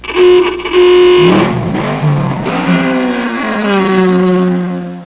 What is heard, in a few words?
hooter racecar; Wheels!